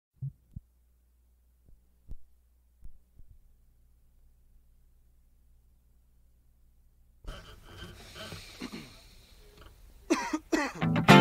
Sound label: Instrumental